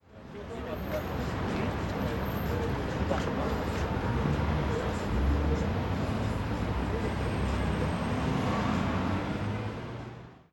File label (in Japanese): 繁華街